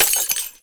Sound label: bottle smash